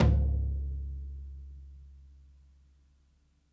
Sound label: ROOMY FT SOFT, DRUMS ONE SHOTS; BASEMENT DRUMS VOL 2